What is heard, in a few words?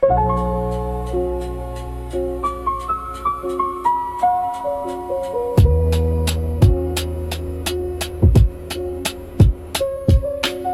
dark lo fi for meditation piano rhythmic